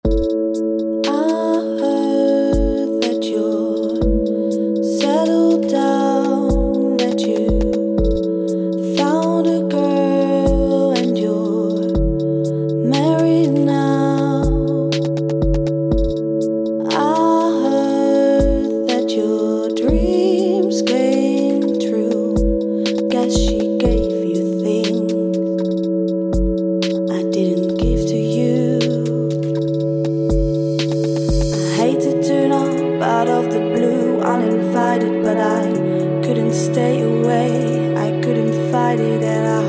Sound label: vocal; experimenten vocals